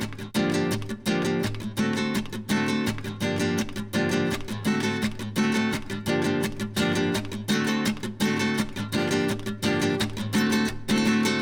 Acoustic Guitar; Guitars

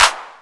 CLAP; SoundFactory

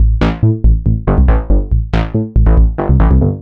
Synth Bass; Basses

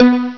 Detonate Count; Bombzuka; Retro

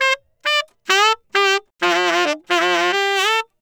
Sax

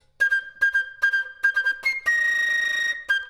Flute

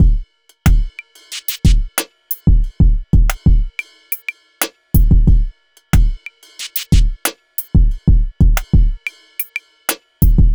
Urban Beat, Drums

